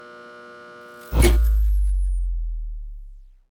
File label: Short Circuit